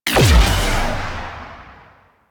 Crewship Missile Swarm Fire; Vehicles; Crew Ships; Weapons; Warframe; Rail Jack; Corpus Crew Ship

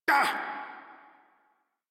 Pain Modular Corpus Male; Dialog; Barks; Battle; Warframe

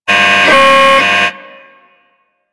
Puzzle Input Correct; Ambience; Warframe; Corpus Gas City; Gameplay Remaster

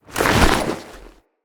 Foley Fast; Corpus Lich; Idle Screen

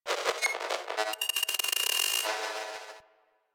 Venus Corpus Data; Ambience; Warframe; Venus Open World; Venus Landscape

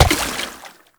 Step Water; Warframe; Uriel; Movement; Steps

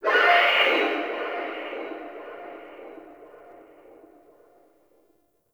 Stadium Infested Far

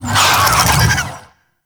Infested Big Ashen Vocal Attack Death; Warframe

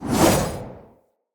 Long Sword Whoosh Heavy; Weapons; Mother

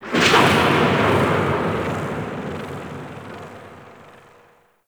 Ship Exterior Thunder Claps; Warframe; Corpus Ship Remaster

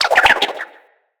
Grapple Rope By; Weapons

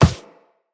Walk Default, Footsteps; Operator

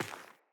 Sandals Run Rock, Footsteps; Player

